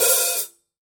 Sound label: open